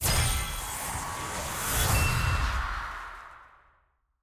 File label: orb outro; loot; worlds 2018